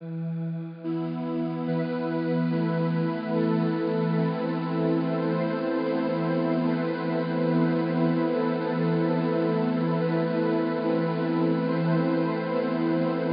Orchestral Synth Building E, Cinematic Trailer Loops